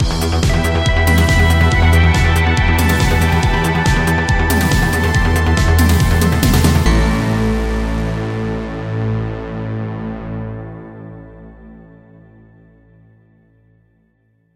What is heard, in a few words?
Synthwave Win Theme; Battle Pass S2